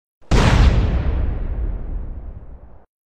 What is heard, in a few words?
explode; AssaltoCassino